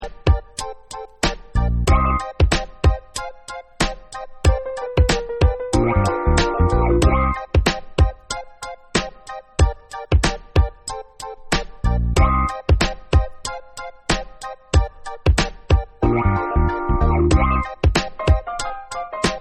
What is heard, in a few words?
Remix Instrumental